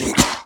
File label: Swamp boss Spit